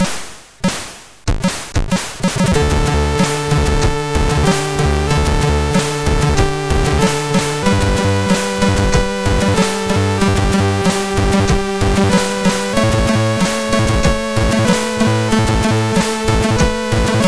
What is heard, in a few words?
MOS6581R4 sid; Nightbreed